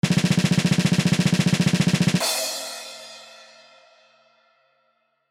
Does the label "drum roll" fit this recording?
Yes